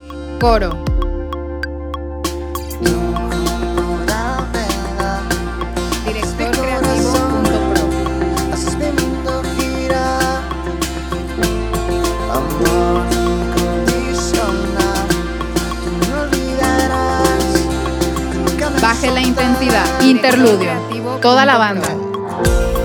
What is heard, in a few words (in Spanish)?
Gravedad, F, 98, 4/4